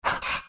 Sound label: pant big, greevil; npc; courier